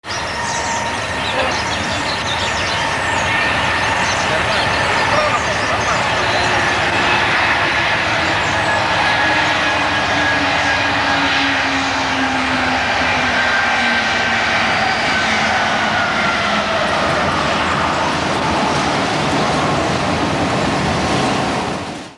MX627 A-466 screams into Lianokladi on train 501 with dynamic brakes on; MLW A-466